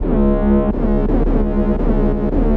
Bass Cmi 85

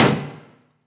Tearout Perc Glock; XLNT

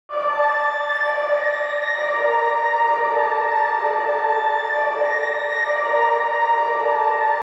Pluck Forest Runes

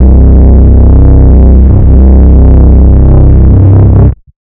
C Minor Bass

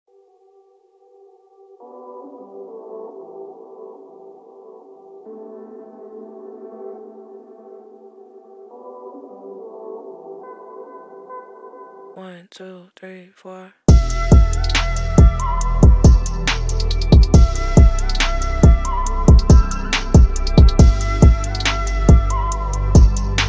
Angelic Vibes; Love Letter

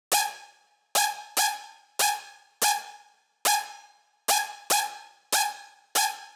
Synth G- 144

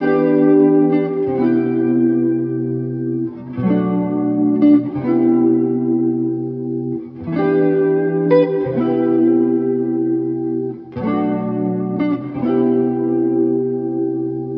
130 electric guitar chords away Em

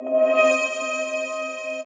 Stretched Audio

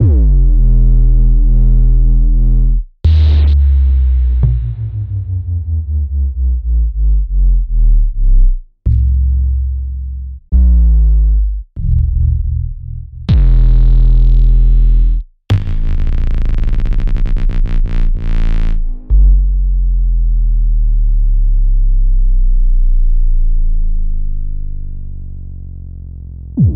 Bass Weight Low End Elements

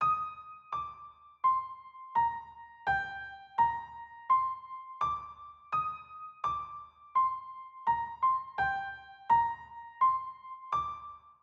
Cm 168bpm piano; Yung Boi Drip Vol 6

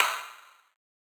Ride

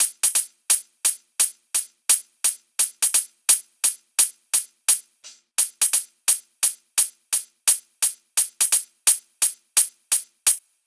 Drums 86bpm Hi Hats Loop

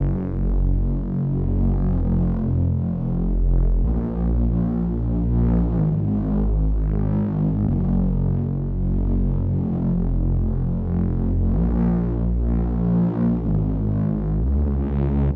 125 bass trap sub Bbmin

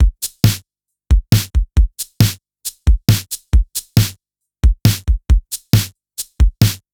Drum Loop 136bpm

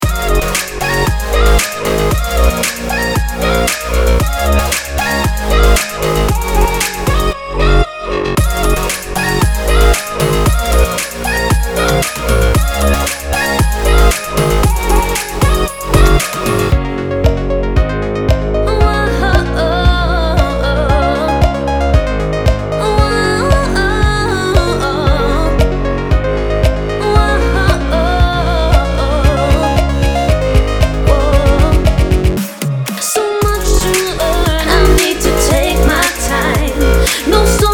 Vocals Future Pop

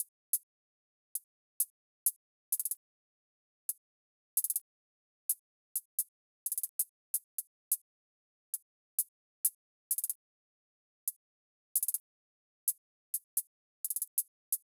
HiHat

